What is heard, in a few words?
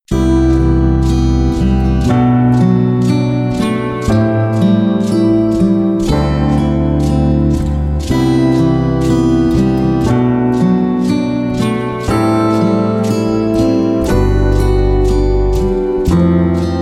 Ambient 60 bpm